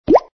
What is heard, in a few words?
Button; Seewo; EasiNote5